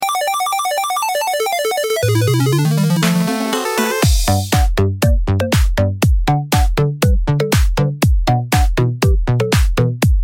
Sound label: funk groove, music; freepixel; Retro Genres